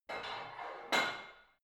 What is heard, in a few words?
barbell heavy plate put on reverby hard, fitnessstudio